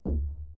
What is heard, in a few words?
crossbow fire distant; hl1 weapon